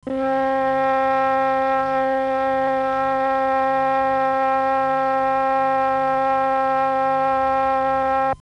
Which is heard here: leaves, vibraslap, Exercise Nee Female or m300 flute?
m300 flute